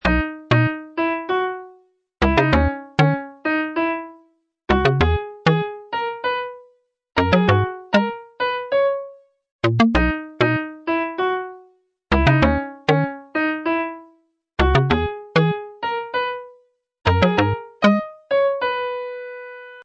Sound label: Lose; Flying Metamorphosis Queen of SM Bar Fuate Co; android